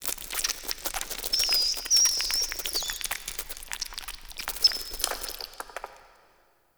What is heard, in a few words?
Clan Dojo Misc Mice; Dagath; Warframe; Ambience